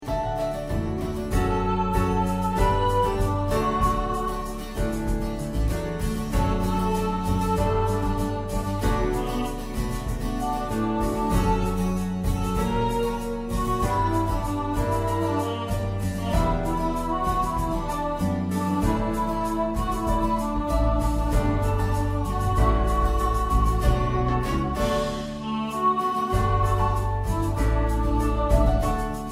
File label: instrumental with piano lead